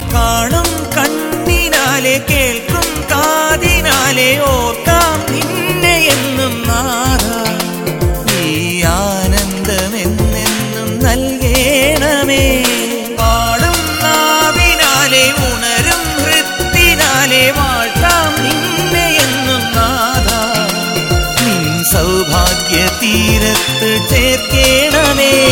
christian devotional

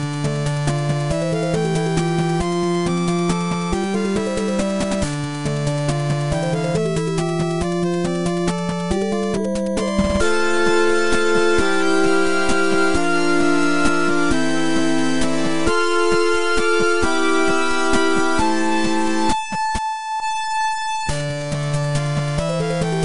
8 bit; Skyward sword

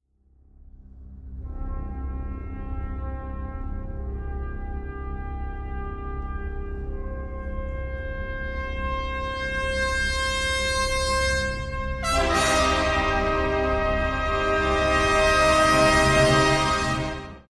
Short Trumpets

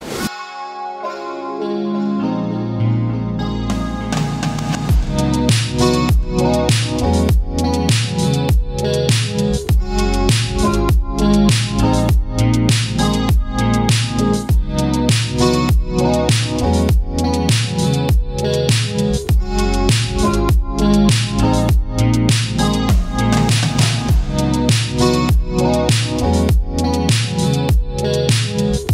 VaporWave RingTone

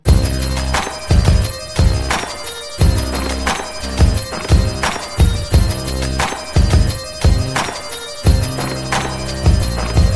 black beat, hip hop

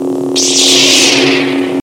Lightsaber; Obi-Wan

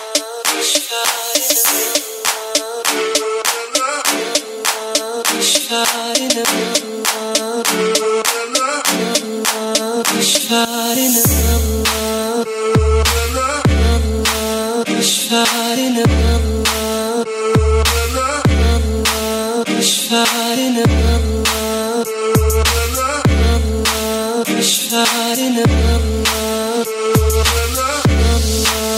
Arabic Beat

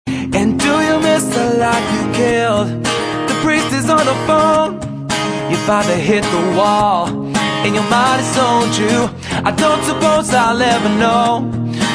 live acoustic cover

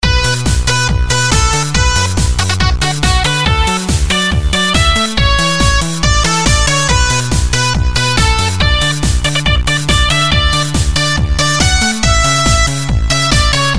bass eurodance, ringtones, electronic

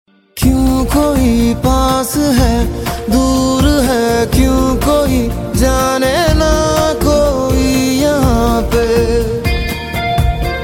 Whist

